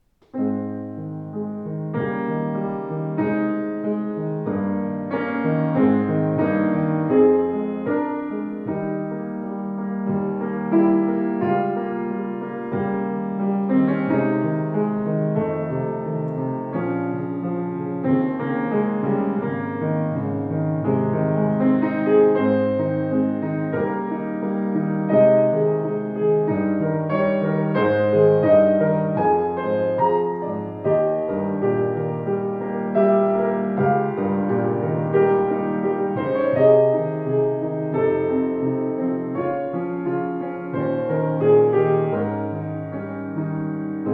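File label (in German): Yamaha C3, Flügel; 186cm-c3-fluegel-2003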